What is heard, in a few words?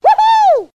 woo hoo; Voice; ooh ahh